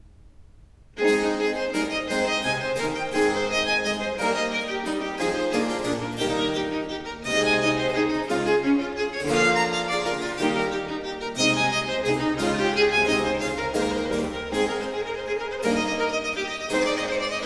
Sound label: Sonate 2 in B flat major 3. Vivace; Arcangelo Corelli; Corelli - Complete Works; Klassika